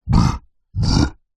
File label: horror creature monster growl short x2; zapsplat